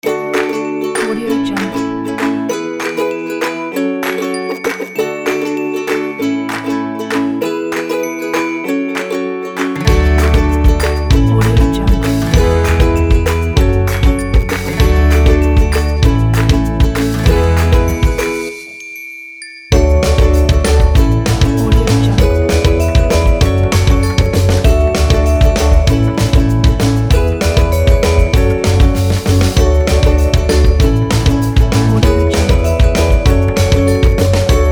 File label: happy